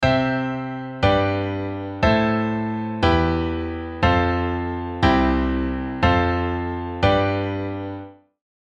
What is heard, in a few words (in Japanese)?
カノン進行